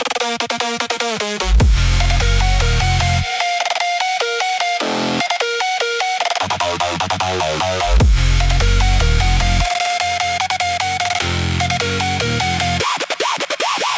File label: Max Reps BPM 150